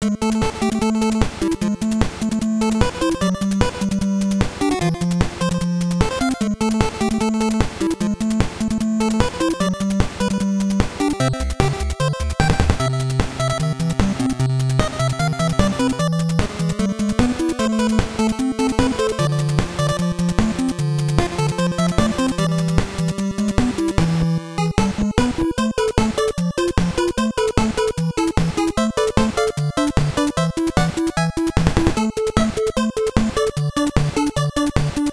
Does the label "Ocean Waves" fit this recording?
Yes